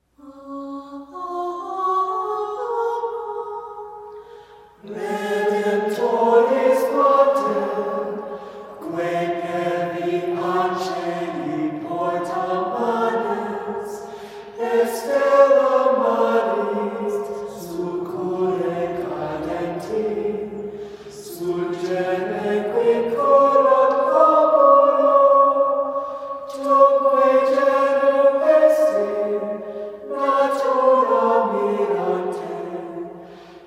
Alma Redemptoris Gregorian chant

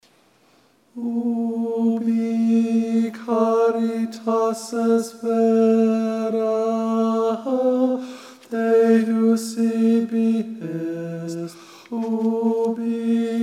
Ubi Caritas Tenor; Lent/Holy Week 2013 Rehearsal recordings

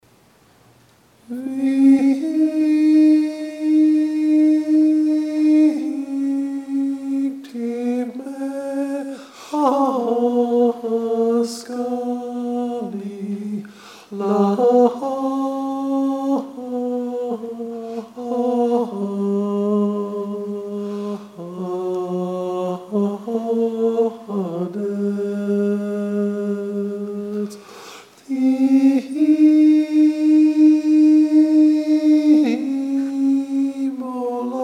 Victimae Tenor Solo; Lent/Holy Week 2013 Rehearsal recordings

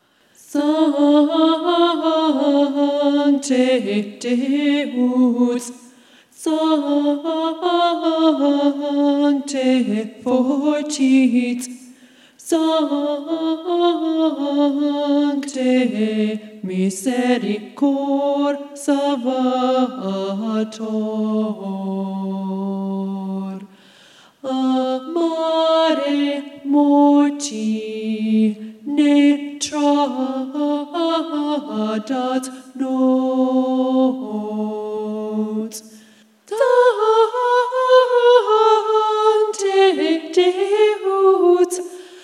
Soprano; Media Vita; Lent/Holy Week 2013 Rehearsal recordings

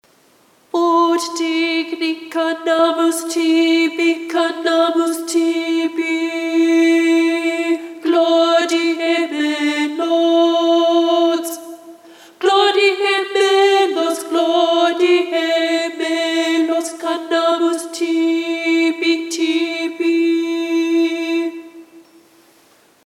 Aufer a nobis Alto

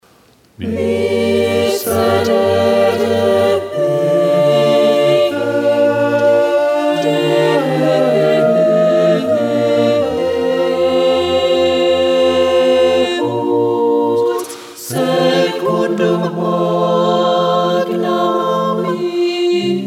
Allegri Miserere SATB; Allegri first page SATB; Lent/Holy Week 2013 Rehearsal recordings

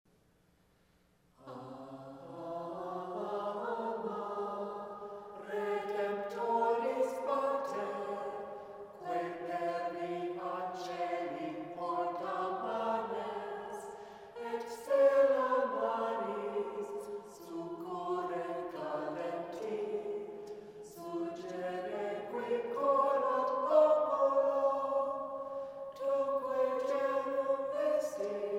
Alma Redemptoris Mater chant

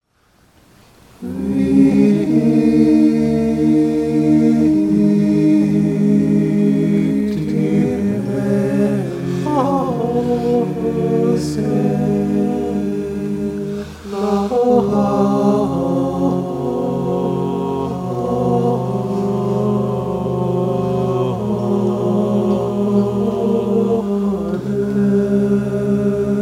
SATB; Victimae; Lent/Holy Week 2013 Rehearsal recordings

